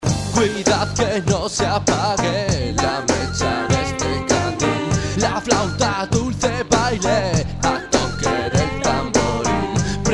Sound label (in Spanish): tono